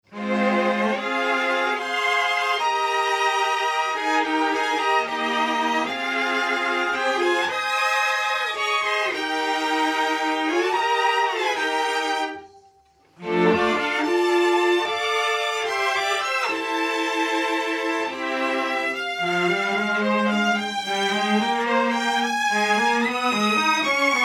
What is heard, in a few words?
Mix Strings Pan On Mic